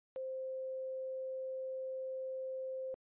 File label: boar